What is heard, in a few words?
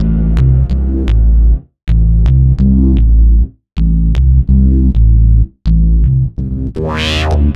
Gated Bass; Sonic Foundry (Sony Creative Software); Underground UK House & Electro